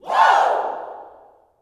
"WOO", Gospel, YELL SHOUT; Spectrasonics; Vocal Planet Vol.1 Gospel; akai

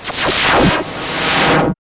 REVERS

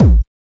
HSE-BD

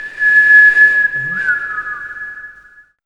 WISTLE

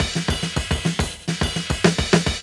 LOOP, DRUM; E-Lab; X Static Goldmine 2; akai

